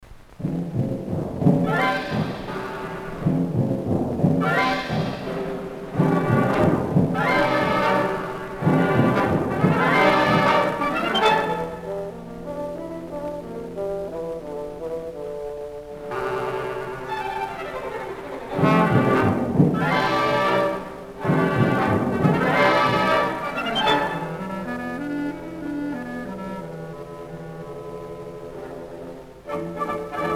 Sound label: Filtered